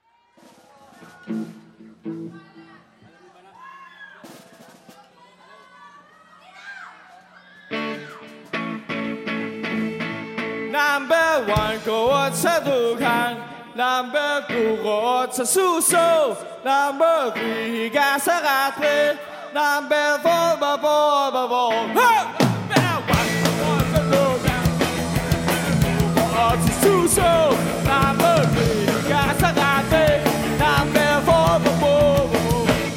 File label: Traditional Visayan Folk Song; Bapor-Bapor